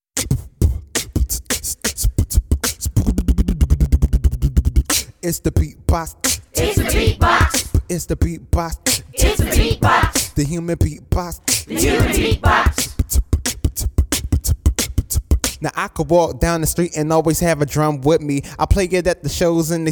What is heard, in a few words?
Banjo To Beatbox, Its The Beatbox